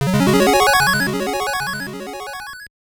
Level Up; Defenders of Oasis; Game Gear

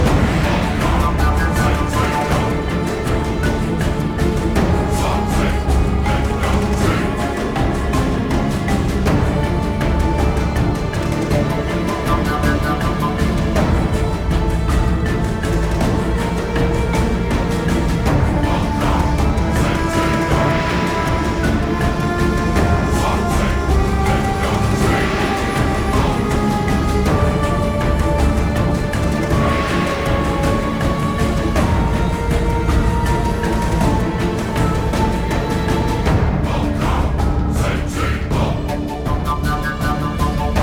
Boss Fight

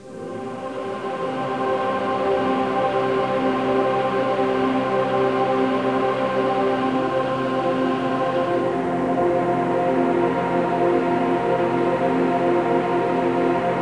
Pad; Great Eagle 2

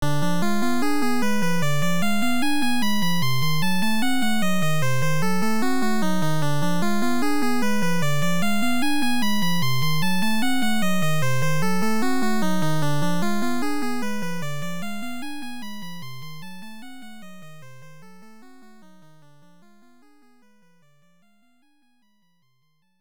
Bonus Stage; Namazu Dayu; Vs. Ninja Jajamaru-kun; VS System; arcade

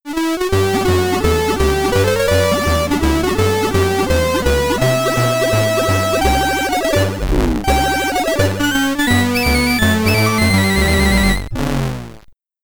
Task Completed, SID Stereo

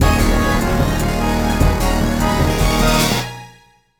BGM STAGE BATTLE; Phantasy Star Zero

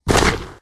Dwarves Quarry Worker Buried Out

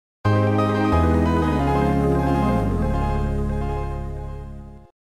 Logo (Arcade Version 2)

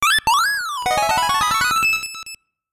Victory!

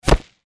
bullet impact dirt

